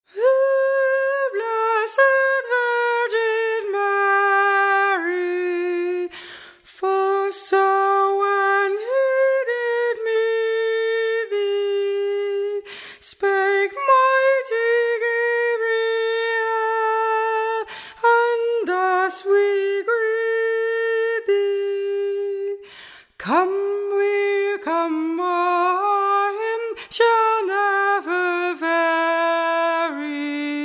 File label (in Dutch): SOPRAAN